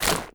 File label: zombie move short, foley; creatures; Half Life Model Pack